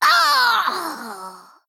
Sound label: Female Goblin Death